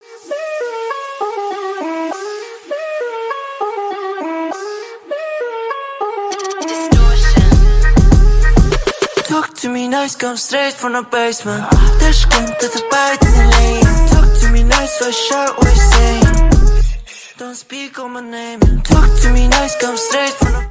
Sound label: Chinese Ringtone